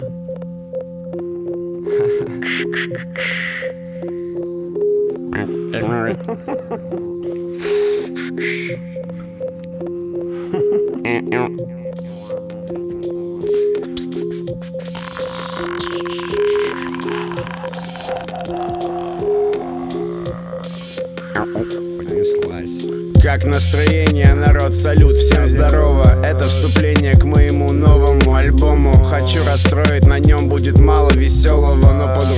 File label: scratch